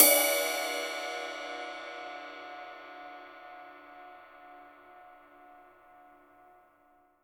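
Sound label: cymbal, Real Drum Kit; Best Service ProSamples vol.43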